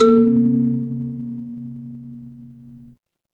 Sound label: instrument kalimba